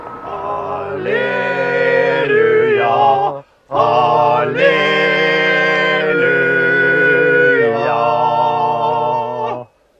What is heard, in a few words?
Halleluja